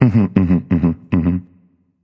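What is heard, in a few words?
non verbal thinking, voice; cernunnos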